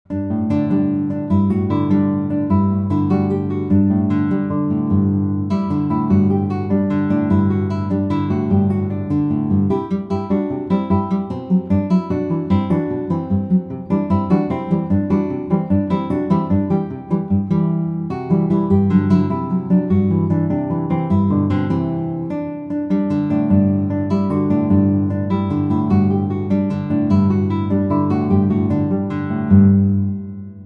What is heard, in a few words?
Five Fingers Solo, guitar